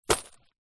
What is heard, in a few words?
purse pouch leather containing coins and notes drop carpet; foley